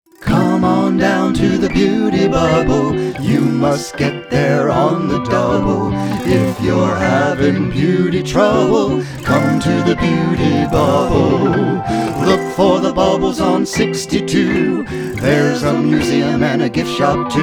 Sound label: beautybubble jingle